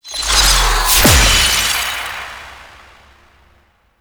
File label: empowered geode cast; Citrine